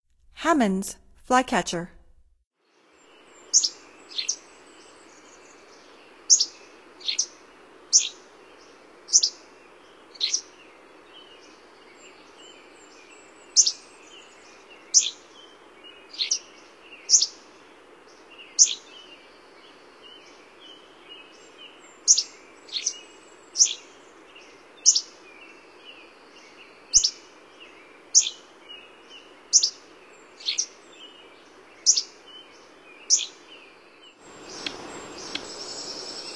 Hammonds Flycatcher; North America; Stokes

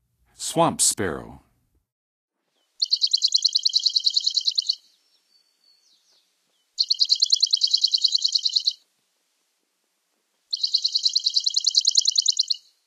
Swamp Sparrow, Songs of Birds of North America; Western USA; Western USA Songbirds

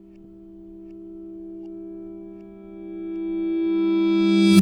Backwards Acoustic, Guitars

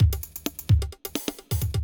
electro kit 130

